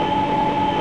Motor, Train; KCSV7 csatolt

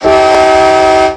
klaxon, Train; F40PH-2 x3 13 Car Superliner Long Distance Pull

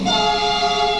klaxon, Train; MDmot

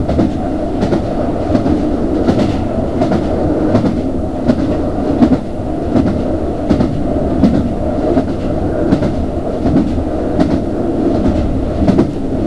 run, Train cl158; OpenBVE

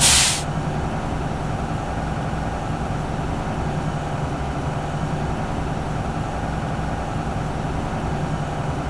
Cp Start, Train; FCMB 2000; OpenBVE

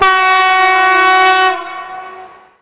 klaxon, Train; OpenBVE; Expreso CIWL Fleche DOr

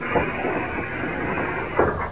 Door Open, Train; OpenBVE

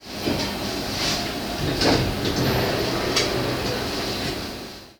Door Open, Train; H-Start 415 Stadler Flirt

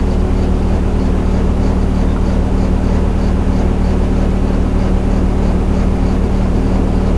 idle, CLASS 47, Train; OpenBVE

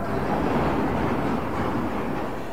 door open, Train; LT1938

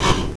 buzzer, Train; OpenBVE

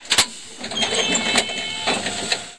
Door Open, Train; OpenBVE

